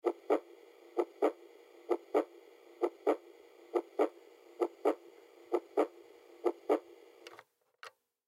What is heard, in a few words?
Baby Toy Heartbeat; Baby Heart Simulator Beat Heartbeat; Cartoon; Household; Blastwave